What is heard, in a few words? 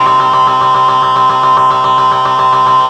Dance Mega Synth; Best Service; 90 s Sample CDs